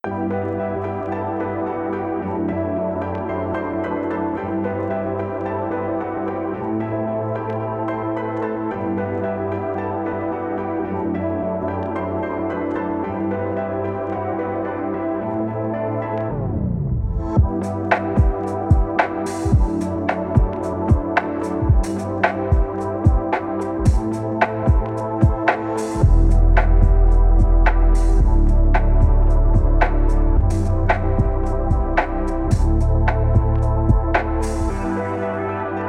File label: Slowed Reverb